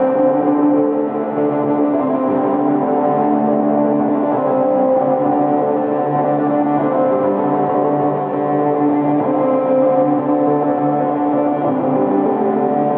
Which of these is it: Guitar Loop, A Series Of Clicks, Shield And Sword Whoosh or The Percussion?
Guitar Loop